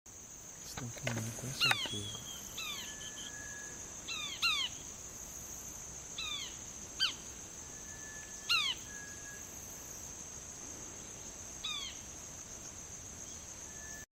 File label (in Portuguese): Canto do bem te vi barulhento